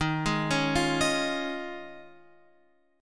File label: D sharp 7th Suspended 2nd Suspended 4th, Guitar, Open F; D#7sus2sus4; arpeggiated